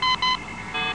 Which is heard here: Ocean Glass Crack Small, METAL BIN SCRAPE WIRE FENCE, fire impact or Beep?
Beep